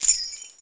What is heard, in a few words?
Pickup Key; SFX Kit; Pickups